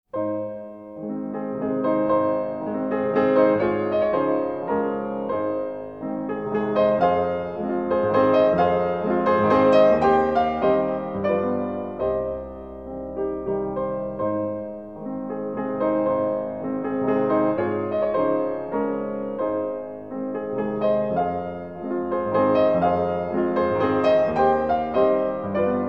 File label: Piano, Waltz